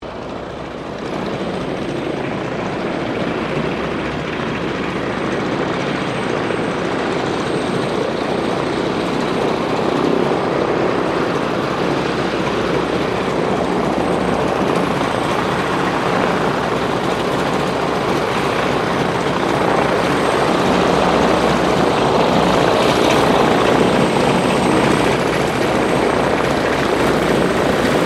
AIRCRAFT STINSON RELIANT SR-8C TAXI; 1935; Airplanes; Vehicles

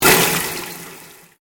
Convolution Pulse

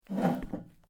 METAL GIRDIR MOVE; Materials

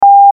Beep Sine Wave Short

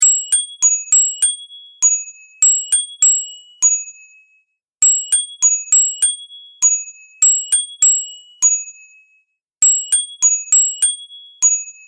Bell Chime Seamless Loop; Bell Sound Effects

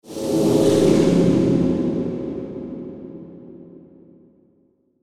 Whoosh Static Reverb Metallic; Blastwave

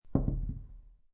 Dice Throw One Die On Felt

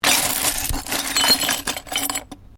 Glass shards movement; Material components for constructing more complex sounds; Materials

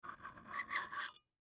Household Bowl Empty Spoon Scrape Dish; Dishware

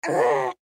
Human Groan; Groan Male Seven Yrs Boy; Pain; Blastwave

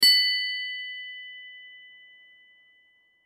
Accent Glockenspiel Chime; Mallet; Mallets; Blastwave

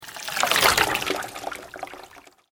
Sweep Organic Slow

